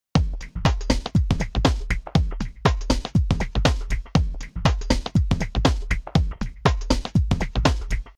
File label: normal drum loop